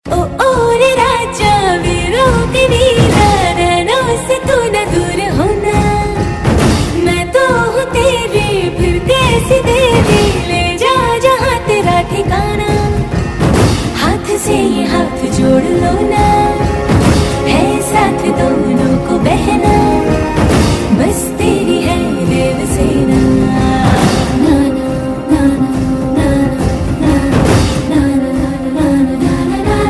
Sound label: Song Ringtone